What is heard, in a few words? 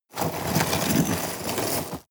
scrape rocks hard